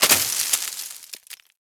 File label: Landscape Plant Destroyed; Warframe; Venus Open World